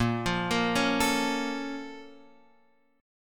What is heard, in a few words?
Bb Minor Major 7th, Guitar, Open F; x,5,5,5,4,4; arpeggiated; chord shape